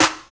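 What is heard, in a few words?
WAKEUP SNARE; Drums In Your FACE!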